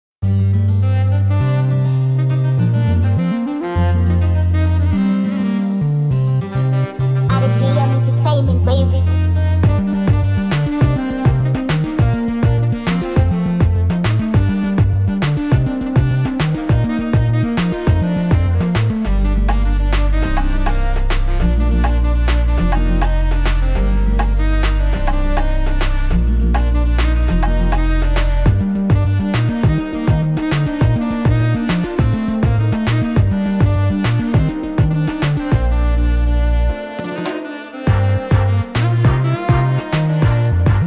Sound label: Saxophone Version